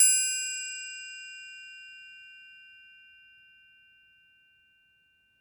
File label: Triangle Open